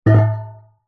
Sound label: industrial metal large container down on ground